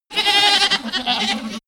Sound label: goat baaing goats